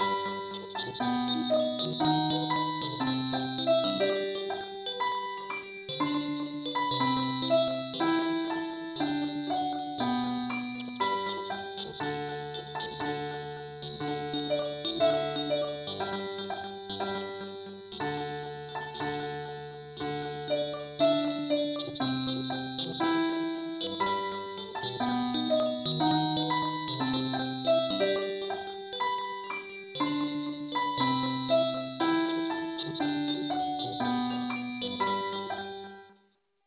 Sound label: mandolin key g